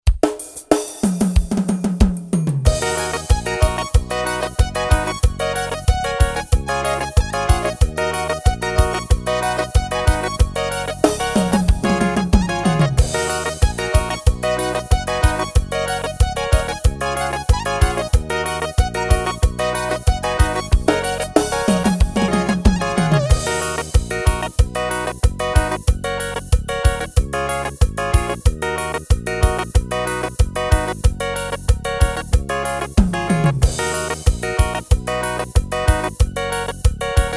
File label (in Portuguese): forró